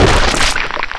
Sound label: death; Alien Arena; lezard